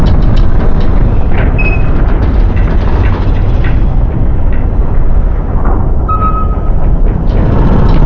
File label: Door Move